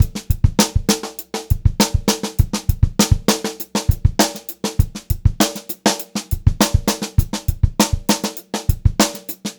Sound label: BEAT, Funk-Groove Drumloops